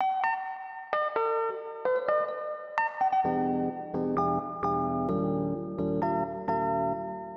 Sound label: piano